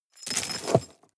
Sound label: sweep small rocks soft